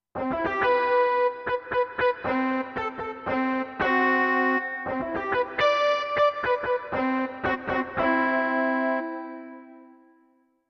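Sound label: Distorted Lead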